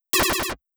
dispel oof

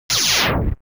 haste off

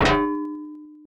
disarm shield

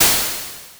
teleport

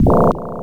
protection off

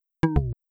enlarge off

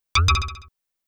duplicate destroy

